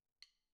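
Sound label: Floor, tom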